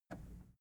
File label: plastic button scratch push disable